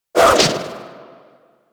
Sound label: Auto Crossbow Tail; Warframe; Tenno